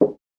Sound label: wooden small